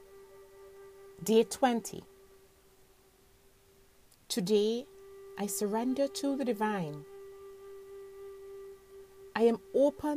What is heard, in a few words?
healing mantra